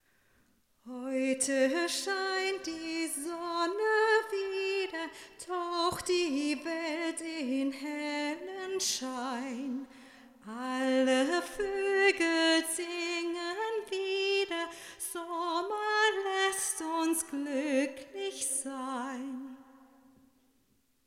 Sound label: Vocals smart reverb